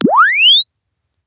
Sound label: Press Two Sides Chirp; Samsung 4 Recordings